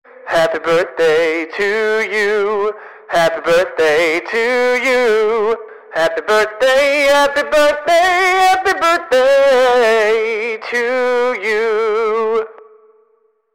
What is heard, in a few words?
Happy Birthday Song in English on megaphone